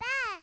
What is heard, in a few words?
VOC